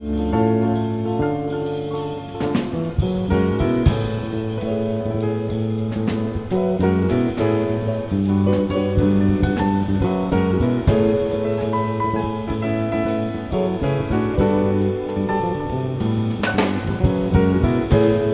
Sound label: Jazz Trio